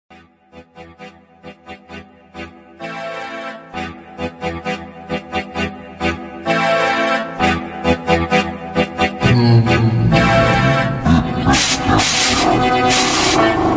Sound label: super slowed and reverb